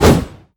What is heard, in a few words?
whoosh